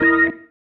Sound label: Stab One Shots, Organ